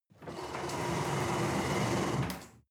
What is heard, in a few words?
table slide on floor